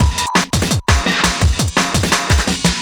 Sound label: Beat, Drum N Bass